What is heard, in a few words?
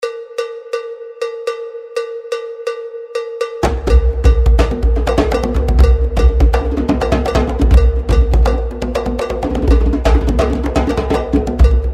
Clave